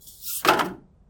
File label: mailbox